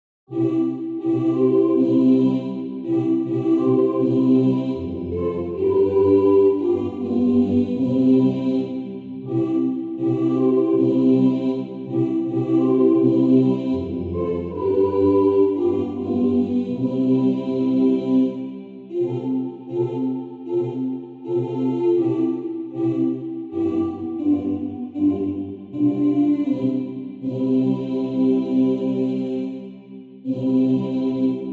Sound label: haimahn alt